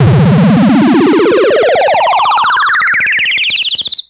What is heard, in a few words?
all powered up; Zaps and Beeps